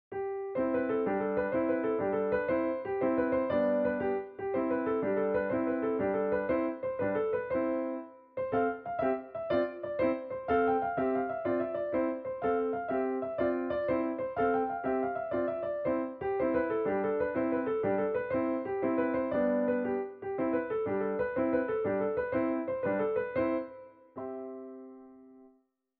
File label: Tarantella